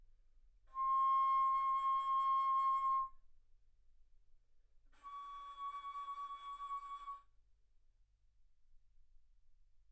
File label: Bass Flute ff C6 Db6